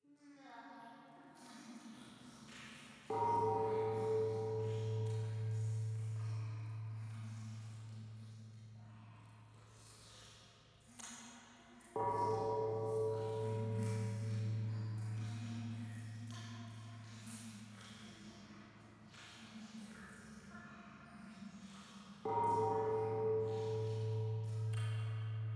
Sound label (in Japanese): 廃墟 フェラチオ 神社